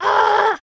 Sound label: Boy 6yo Scream; Infernal Radiation